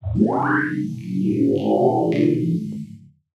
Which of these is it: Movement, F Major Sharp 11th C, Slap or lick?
Movement